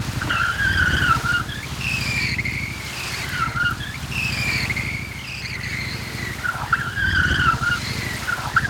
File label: SKID WATER; YBR125